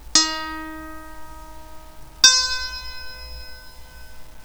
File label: 1 string